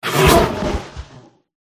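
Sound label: spider death; pauchiha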